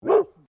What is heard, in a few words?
Animal Dog Labrador Bark; Various domestic dogs; Animals